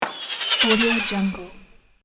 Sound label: firework